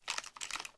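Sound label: inspect movement; Valorant; cstrike; spectre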